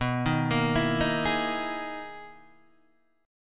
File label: B Major 13th B down Guitar DADGAD